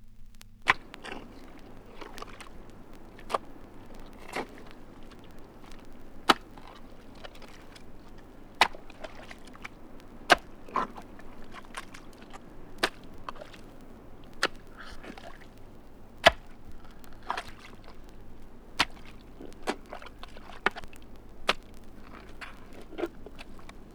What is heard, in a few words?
digging in wet ground - hits - water; Recorded from Sound Effects - Death and Horror rare BBC records and tapes